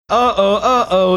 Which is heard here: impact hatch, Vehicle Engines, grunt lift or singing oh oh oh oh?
singing oh oh oh oh